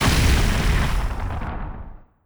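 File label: Laser Hit Exterior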